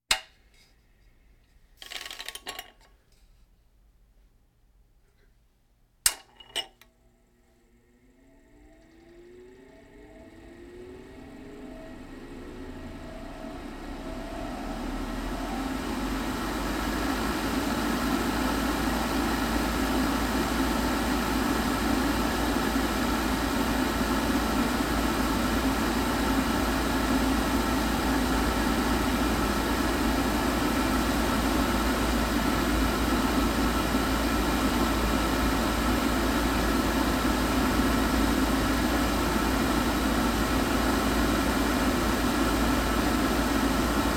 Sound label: Hospital effects